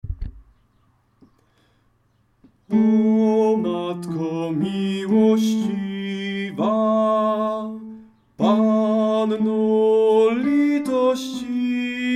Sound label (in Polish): tenor; O Matko milosciwa